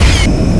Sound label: jump